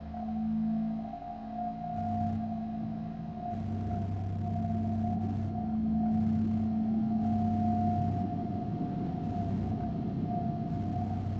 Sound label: Scary Ambience; five nights at freddy s fnaf sister location